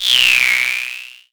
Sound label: Filtered B, Basses; Zero-G; Total Drum Bass